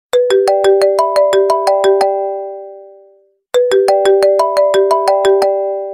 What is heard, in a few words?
apple ring shortened